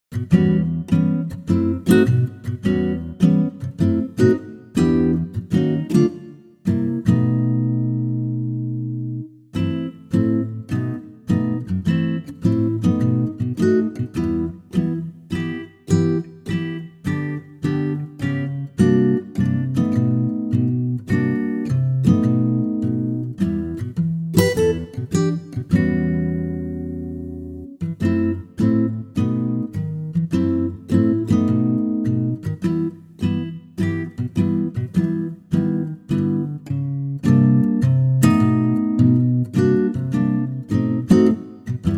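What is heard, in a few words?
E minor acoustic guitar; key - Em - vocal range - E to E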